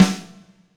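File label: snare, Akai MPC-500; Q1 Kit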